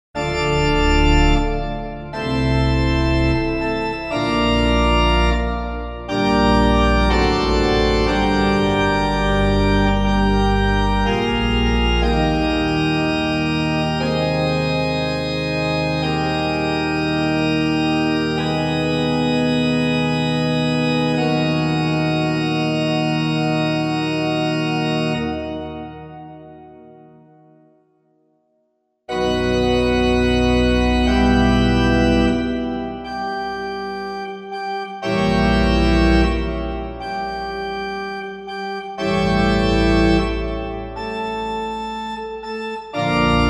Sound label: Plein Jeu; Beauvarlet Charpentier JJ Journal